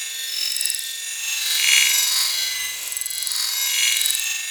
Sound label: Dark Sector Pickup Loop; Warframe